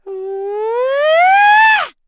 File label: Vox Casting; kingsraid; voices heroes en